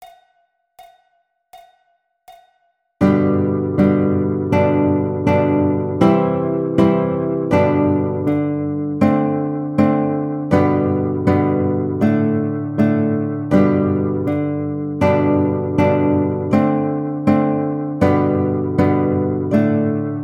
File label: Ah Vous Dirai Je Maman Guitar